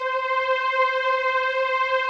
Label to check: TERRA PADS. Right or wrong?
Right